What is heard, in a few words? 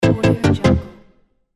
game over